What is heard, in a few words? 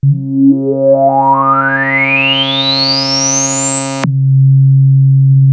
ARP 2600 C3, synthesizer; Hollywood Edge - Giorgio Moroder Rare Synthesizer Collection